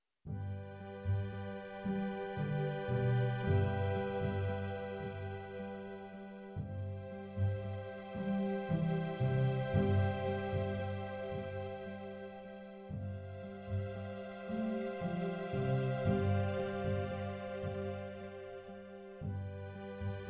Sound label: melody groups violas winds backing dry